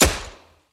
Gun Shot; SoundsCrate

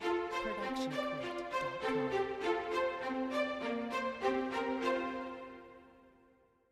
cheerful strings logo; soundscrate

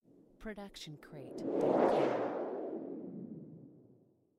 plane fly by; soundscrate

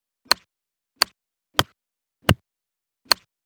golf club approach wedge; mono; soundscrate

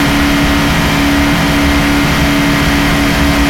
engine loop; soundscrate

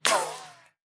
bullet impact ricochet

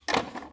VHS input

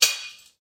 Pot Break; SoundsCrate

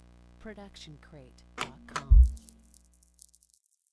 TV Turn Off with CRT Tube Noise and Ground; SoundsCrate

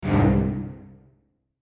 low strings chord

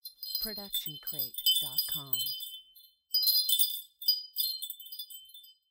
Wind Chimes C

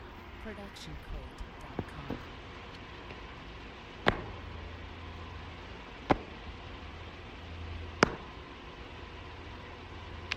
footfall outdoor shoe steps; soundscrate

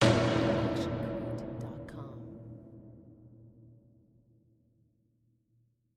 designer ghostly hit; SoundsCrate

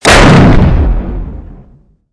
Explosion

